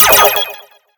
wild Transform; netent

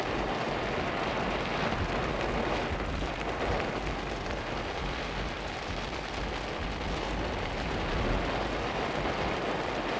bonus Features Won Fire; netent

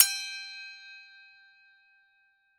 coin count end; netent

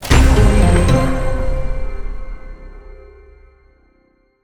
win large level; netent

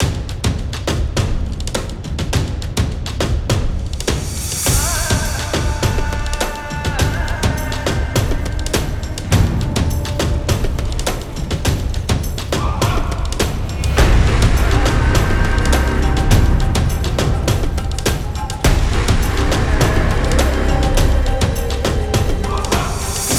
free Spin Amb; netent

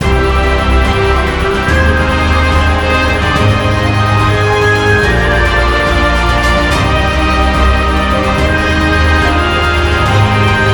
big win music; netent

